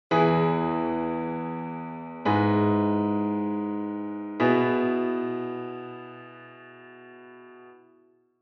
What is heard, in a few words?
e major